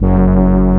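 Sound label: brass G1, SYNTHESIZER analog brass; AKAI S6000 CD-ROM - Volume 1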